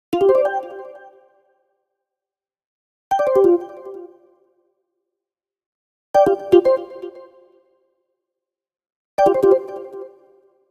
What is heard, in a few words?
Arcade Jingle Positive